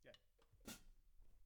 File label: Kit